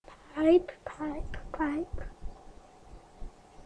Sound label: pipe